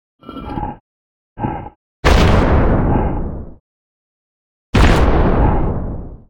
Char Blood Gargoyle Idle Combat

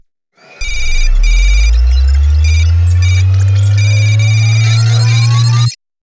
spawn boot comp; Shattered Horizon

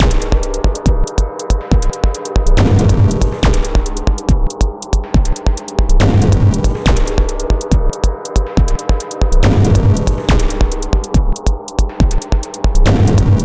Boss Bomber Miss

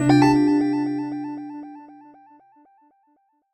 jingle chime positive

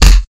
Hit

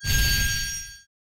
binding bling; gg radiant

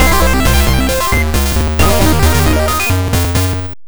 Level Complete

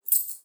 Coins

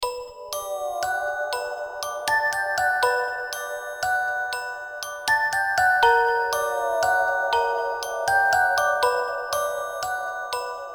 Level underwater; Dr. Maybee and the Adventures of Scarygirl; PSP

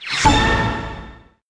Jingle Notice; StreetPass Mii Plaza; Ninja Launcher

